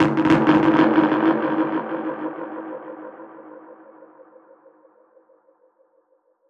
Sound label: Perc Hit, dub percussion